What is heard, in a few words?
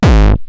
Roland TR-909 BD; BD Selection